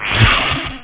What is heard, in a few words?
Decoy; Amiga; Arcade; Wingnuts